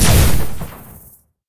Sci-Fi Weapons-Sniper Rifle Single Shot

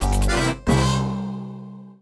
Correct

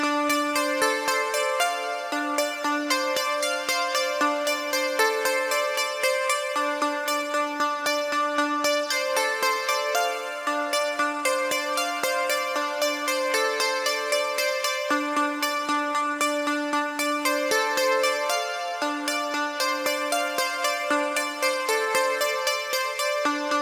Instrumental Synth; nowhere station

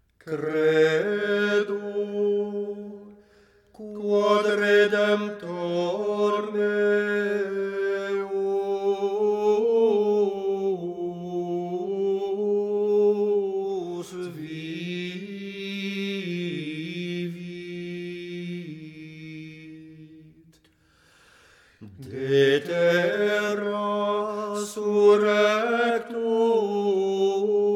Choir; Assassin's Creed Unity

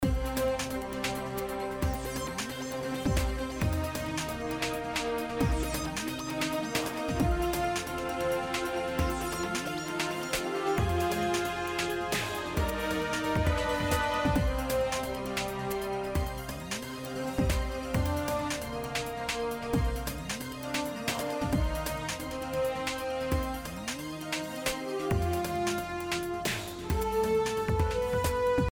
Victory Theme; override mech city brawl ps4 switch windows xbox one gamerip 2018

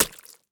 impact mud wet drop splash

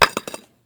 brick hit

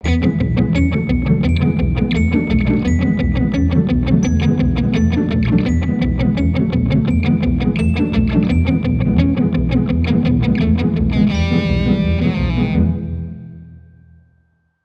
Bari Sax; Simpsons Hit & Run; PS2

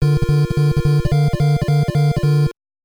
Cavalry Charge; North & South; NES

